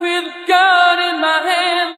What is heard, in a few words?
with a gun in my hand SUNG